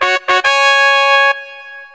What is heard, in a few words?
Trumpets; Lottery Wizard 2.0